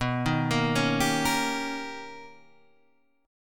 B Augmented Major 9th B Guitar Half Step Down, chord